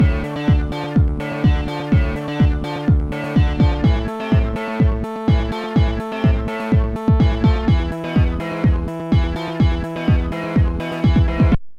downtown raid; MusicLine

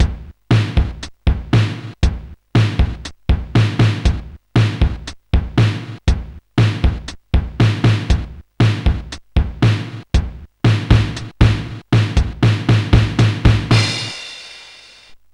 Basic, Sonic Drum Kit

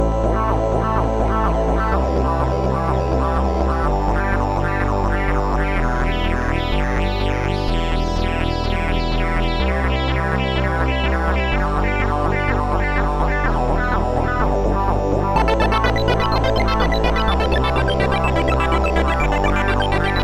squeaker; MusicLine

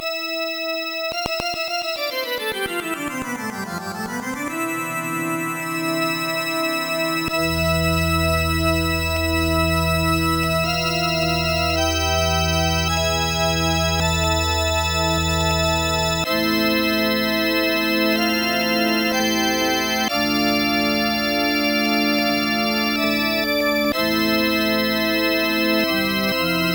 orgel low ORG; Trackers; Modland

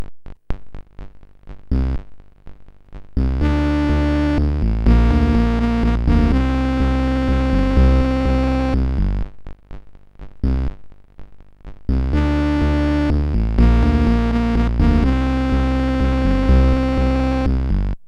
fnord double; MusicLine

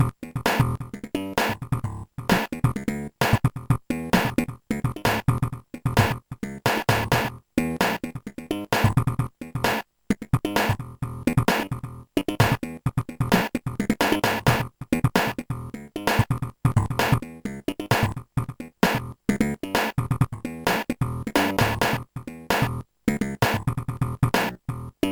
bonk

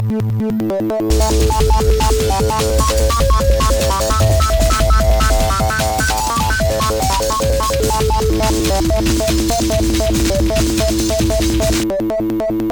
breakbeat dreams

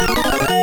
jingles; superscramble

